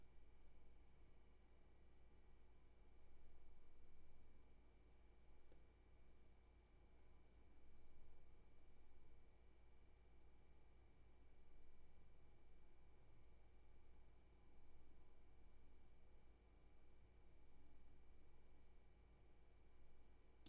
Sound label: roomtone